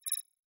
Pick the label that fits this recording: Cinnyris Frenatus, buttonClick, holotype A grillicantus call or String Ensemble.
buttonClick